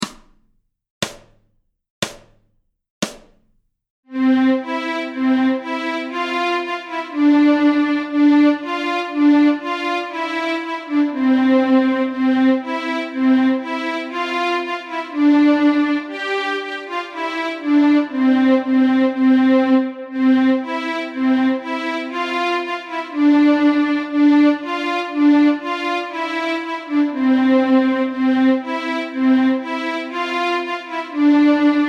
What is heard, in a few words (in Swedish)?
Polkalåt, nyckelharpa; Polkalåt nr 1 Stråk C